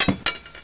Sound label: clink fall; First Knight MM Kit